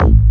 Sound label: attack, BASS, Techno Trance